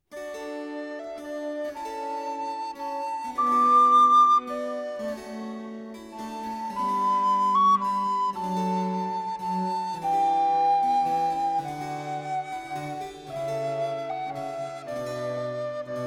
Chamber Concerto in D Major for Recorder and Orchestra; La Pastorella; II. Largo